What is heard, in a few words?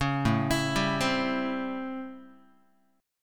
A 7th Sharp 9th, Guitar; A7#9; arpeggiated; JGuitar